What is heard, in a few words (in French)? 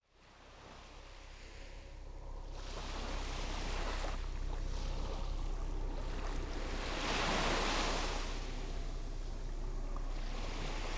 cavaliere sable fin helico loin; KM140 Neumann